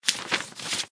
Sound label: paper use; Items handling